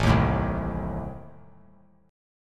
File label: A Diminished A down; Adim strummed; JGuitar